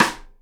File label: SIDE STICK, Live Snares